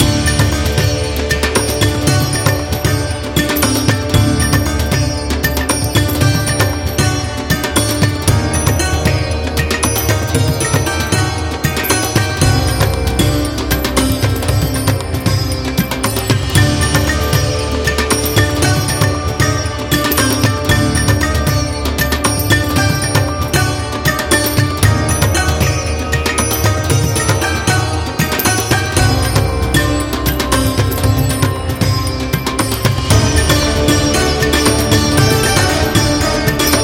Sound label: India Loop